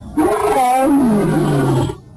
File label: lion roar